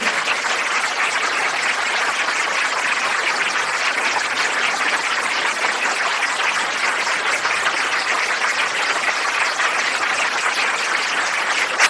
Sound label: applause